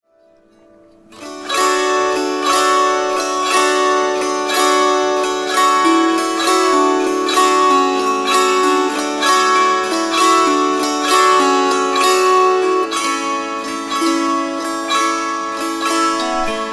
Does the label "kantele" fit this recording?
Yes